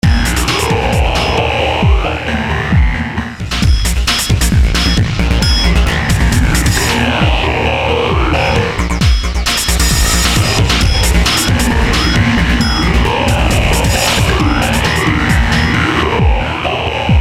BEAT OVERDRIVE, Beat Overdriven Drums Talk Box; Miscellaneous Loops

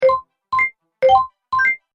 Game Correct Soundpack, Video Game Sound Effects

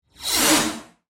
Whoosh Pass By, Fast, Flange, Accent, Transition; Production Element, Imaging Element; BlastWave

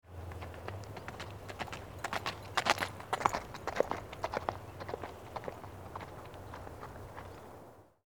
Horse Trot By, Single; Single Horse Trots By , Steady Wind B / G; Animals

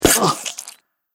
Fight Person Cries As Blade Rips Flesh; Battle Sound Effects; Andrew Fleming

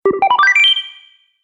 Science fiction Activate Sound; Sf Activate Sound

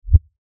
LFE Hit, Lfe Hit Low Bass Impact; Blastwave; Sound Design

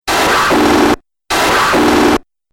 Droid Rust Bucket, robot sound effects

